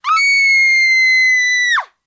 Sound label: Female Scream Shortened